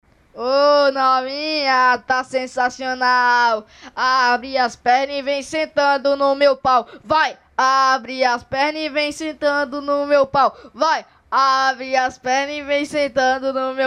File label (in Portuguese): Acappela